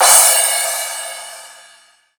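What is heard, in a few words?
hi-hat, Hard & Loud Techno; USB Soundscan vol.01; AKAI; HH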